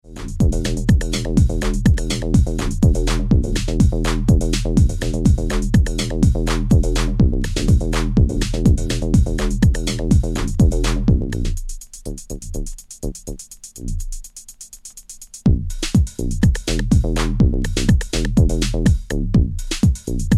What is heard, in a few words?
bassline; Comatonse Recordings (C.008); House, Acid House